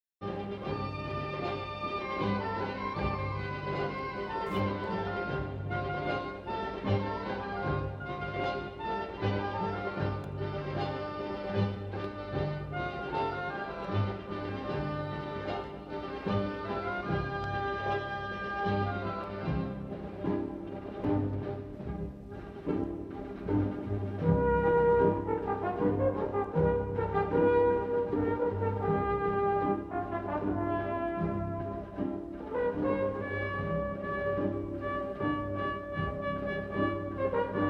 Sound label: Bolero, trombonists; joannes rochut and bolero